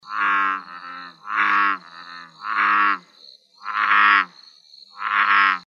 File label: Rana catesbeiana; BIOAC AMPH